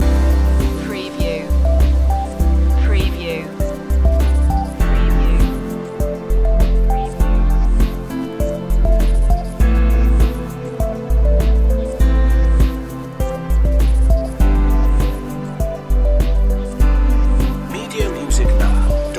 ocean breeze loop

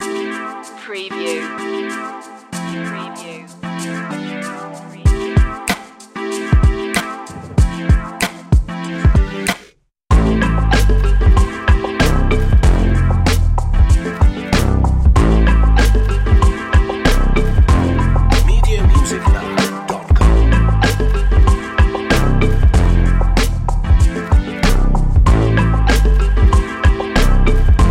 trap

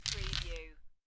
Dice Grab Collect Five Dice From Felt

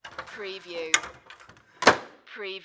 Ladder Close; Sound Effects Bible

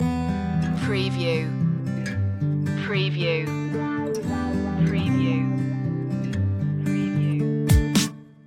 banging pop loop

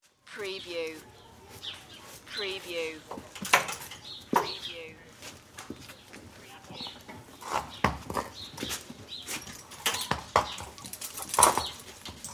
Stable People Chatter; Sound Effects Bible

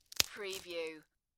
Snapping a Prawn Cracker; beanstalk audio

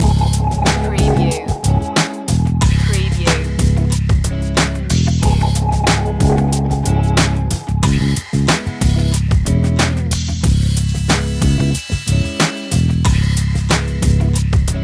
jazz loop

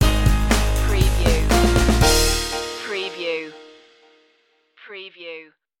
photograph stinger

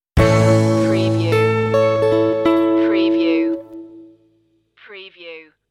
on the range stinger

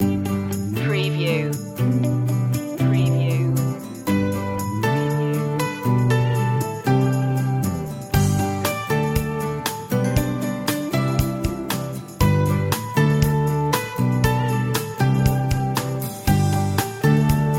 just smile loop

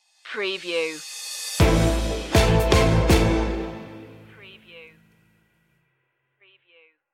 happy to be here stinger

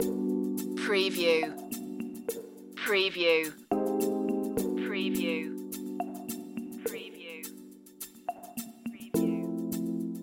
acid jazz loop

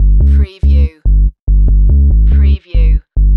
Techno-Trance Sub Bass Loop in G 142 BPM

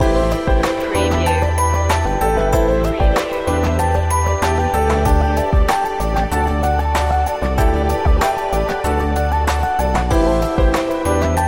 friendly corporate theme loop

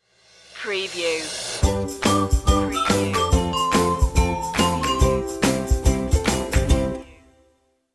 jolly ukulele stinger

